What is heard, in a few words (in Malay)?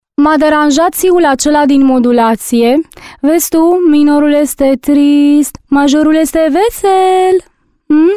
Siul